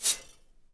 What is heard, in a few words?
Metal Grind